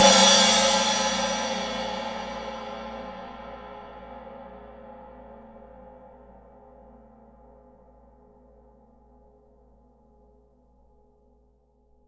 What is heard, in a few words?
crash cymbal sound a key, Tuned cymbal samples